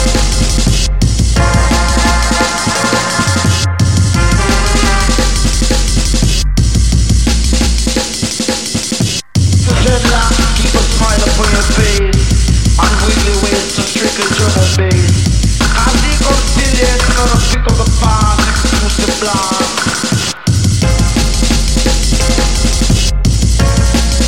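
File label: digital drum and bass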